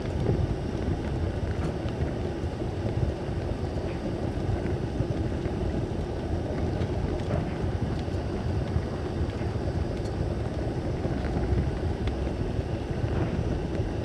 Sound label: heat exchanger; factorio 2.0.55